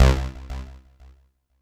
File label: C1, synth line; 90 s Sample CDs; NiceBeats; SynthLine VOL-3